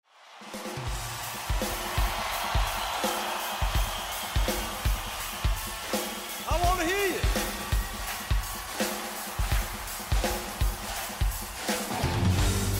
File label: Groove